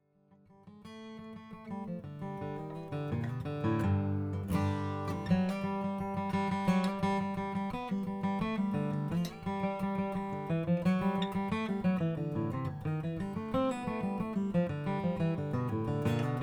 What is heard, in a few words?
collings d2hbaa brazilian rosewood